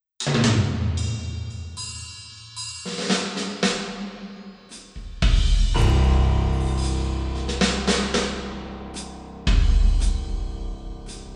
parking garage stick; tascam

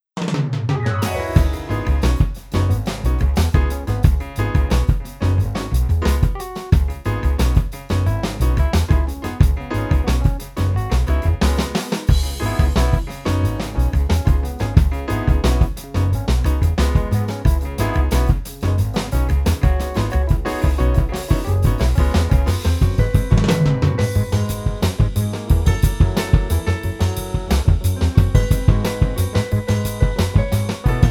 small room falling tom; tascam

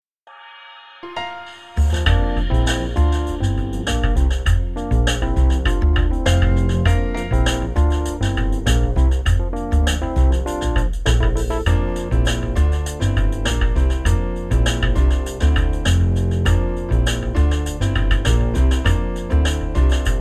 trampoline; slingerland; tascam

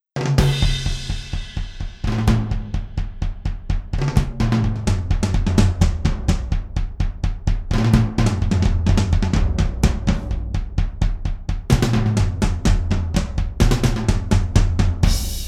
dry clean stick (drums only); tascam

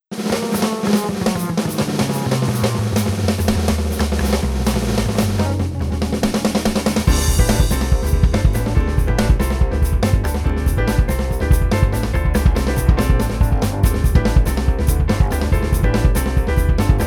dry clean donut piccolo; tascam

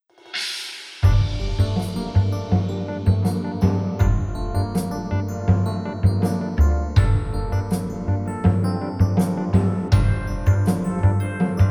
church mallet; tascam

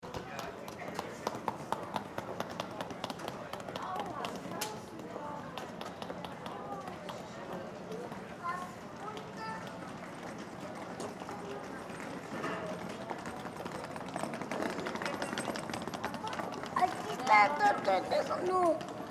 Airport

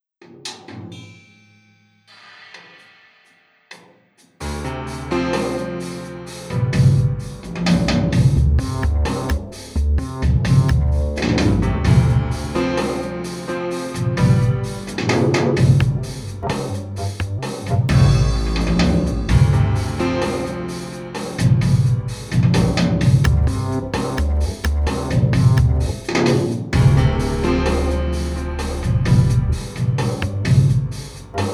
trash room trailer rod; tascam

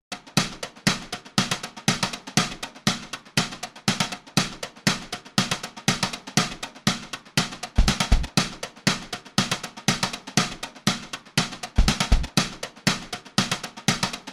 Tennessee 2 Step

